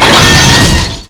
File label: heavy crash, vehicles